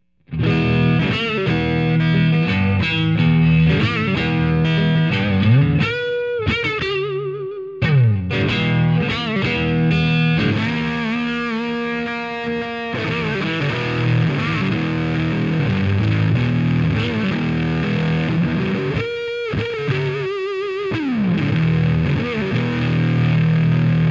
Free Fuzz Legacy

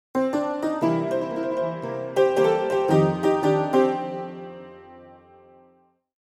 honkey Tonk

